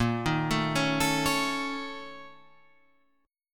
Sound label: A sharp Minor Major 7th Double Flat 5th, A sharp, down